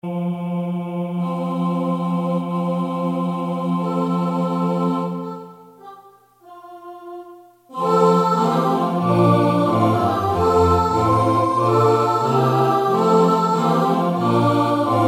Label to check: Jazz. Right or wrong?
Wrong